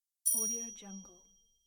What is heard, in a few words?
ding